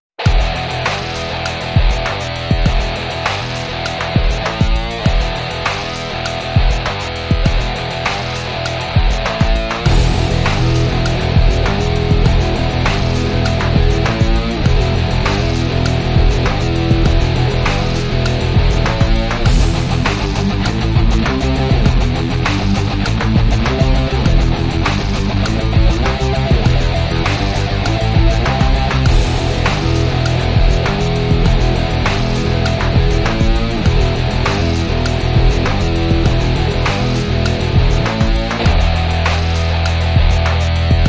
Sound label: underscore